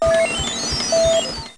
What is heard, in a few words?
Computer; Treasure Galaxy (1994); Learning Company